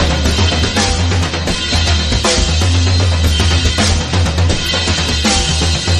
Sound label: drums; horde